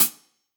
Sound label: Acousti Hat Hit, hihat; ultimate hihat; Hits